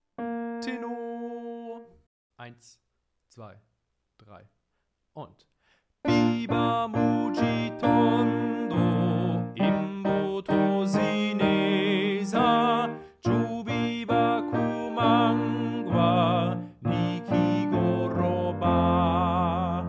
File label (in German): Tenor mit Tutti Klavier